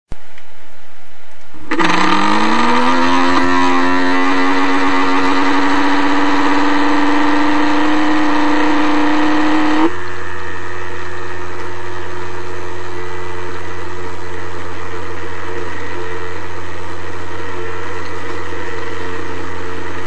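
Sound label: toshiba degrading bearings, hard disk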